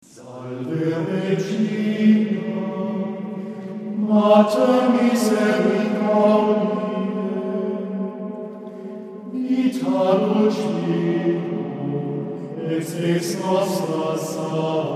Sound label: Antiphon, schola; Salve Regina; o clemens o pia